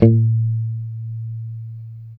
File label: B3 sus, Strat guitar; Spectrasonics; Hans Zimmer Guitars VOL-1; JC-STRAT MTE